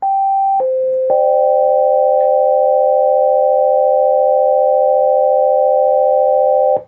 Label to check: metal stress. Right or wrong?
Wrong